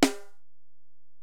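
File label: Acoustic Snare, Alesis DM-PRO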